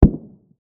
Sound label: bass large, weapons; JSRS Sound Mod